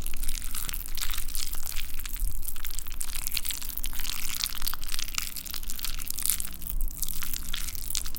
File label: Flash Move MedFast